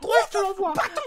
Woof Woof

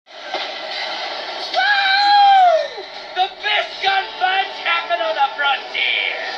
WHOOOO

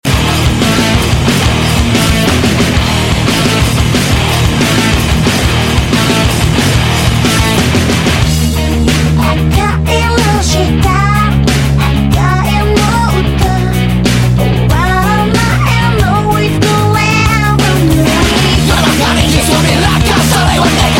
Rock Anime

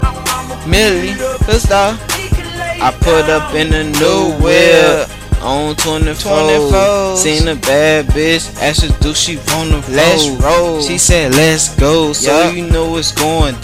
Beat It Up

